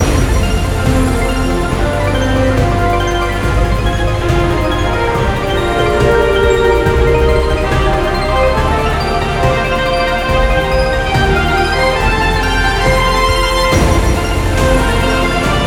mega Win

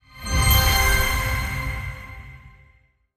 big Win Post; dracula

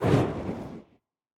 sticky Show; dead or alive 2

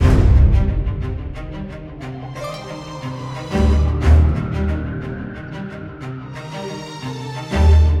freespin Intro Music; divine megaways

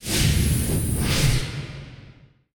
respin logo; codex of fortune

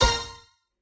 scatter short; spinsane

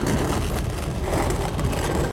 reel Spin; temple of nudges

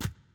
reel bounce; the wolfs night

